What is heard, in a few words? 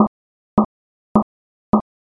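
footsteps slipper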